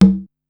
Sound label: Conga, Korg Wavestation-SR